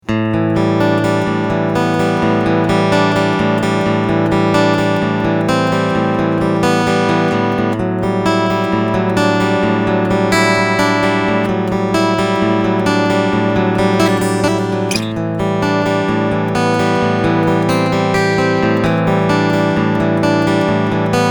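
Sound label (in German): Picking piezo und P90, guitar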